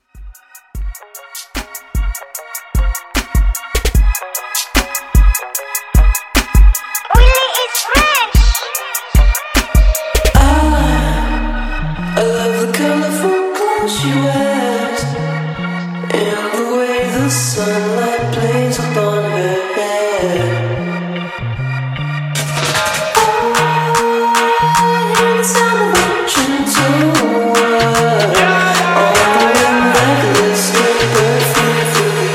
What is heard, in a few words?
Mainstream Pop Redrum; Remixfreakz